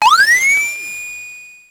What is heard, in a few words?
Drum, Electro-Harmonix Spacedrum